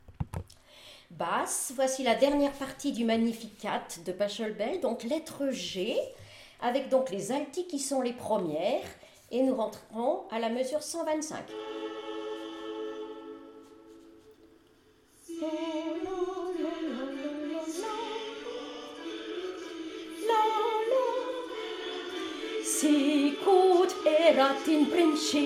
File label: Magnificat Basse Orchestre; Pachelbel